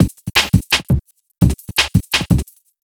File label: Beat, Futurist Drum & Bass; Drums & Percussion